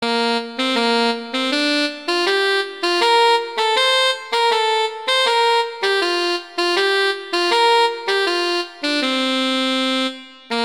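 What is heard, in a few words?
Humoresque, cello; Very Easy Collection; Dvorak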